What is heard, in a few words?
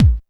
dance 1, bassdrum; Ueberschall; Techno Trance Essentials; DRUMS; BD DANCE1